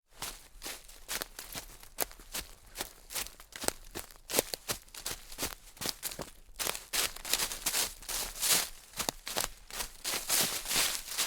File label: running on leaves